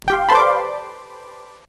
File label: logo sound; vtech